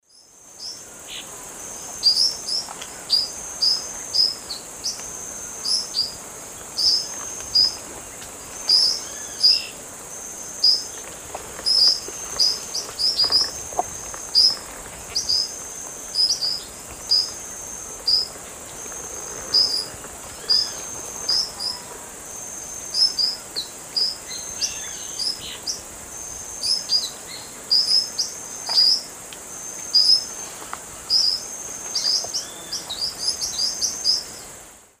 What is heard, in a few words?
Forpus sclateri, group calls; S. America; Eastern Lowlands